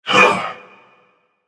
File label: grunt jump; smite; thanatos